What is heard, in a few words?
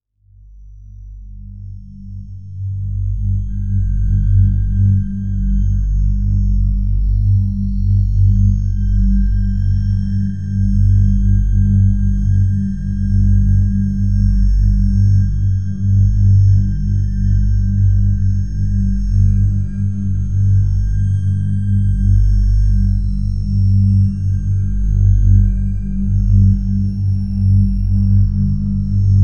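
a deep ambient space drone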